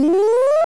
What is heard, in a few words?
bubblegum